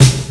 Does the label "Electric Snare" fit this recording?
Yes